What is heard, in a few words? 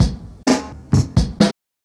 drum loops; bb drum loops